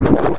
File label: roar